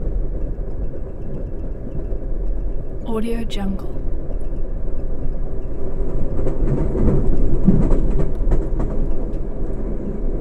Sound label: amtrak superliner; prosoundfx